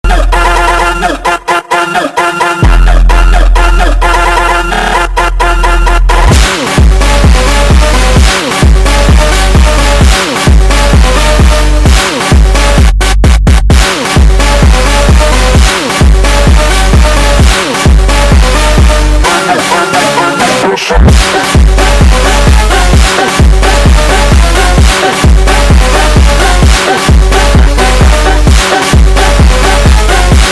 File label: Phonk